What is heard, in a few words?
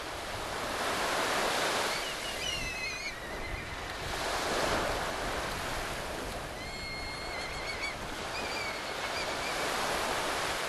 kite